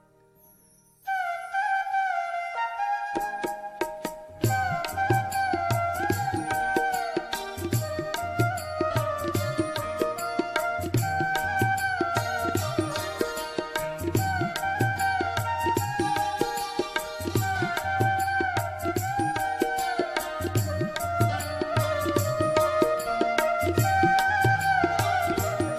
nirgun flute ringtone

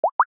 samsung s9 s dew drops ringtone

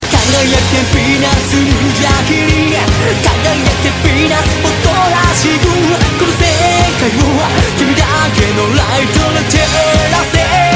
ringtone

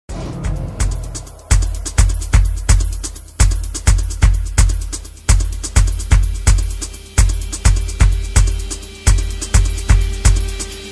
instrumental ringtone

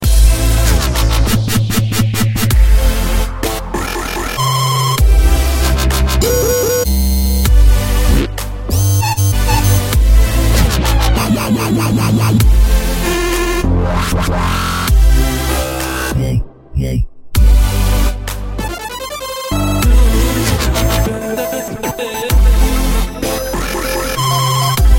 electronica mix ringtone

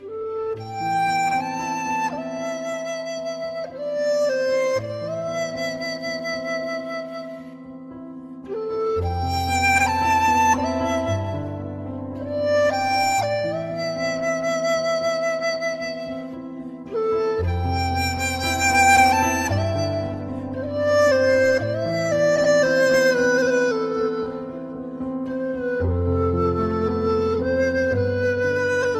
chinese flute music ringtone

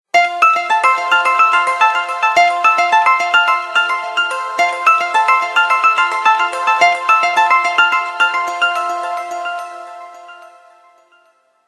cool chillout ringtone

